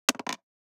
DICE on Plastic, Throw and Roll, Metal, 1 One Die; Samples; ArticulatedSounds; DICE Sfx Party (Extended)

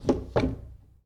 Foosball Blocker Rotate

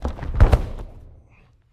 Boxing Ring Bodyfall

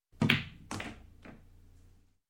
Billiards Hit and Sink; Sound Ideas 2000 Series

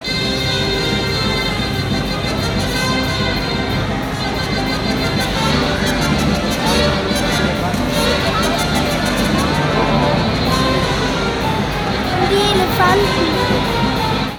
circus music; best service Blue Box

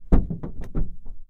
roof body movement; Blind Drive

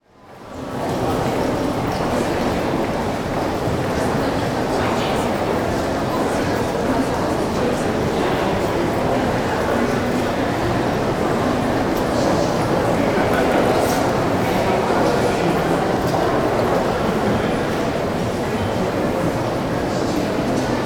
Crowd Indoor Large Atrium; Walla; Sound Ideas; Walla Series

